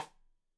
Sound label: Side Stick Stereo; Deeply Sampled Drums; SMDrum